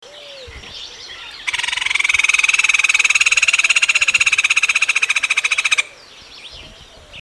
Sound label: hoffmanns woodpecker